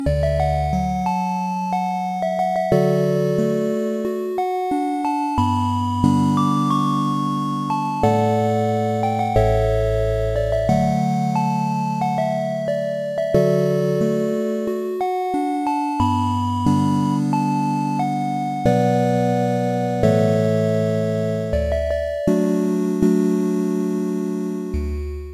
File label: 8 Bit; Hobbit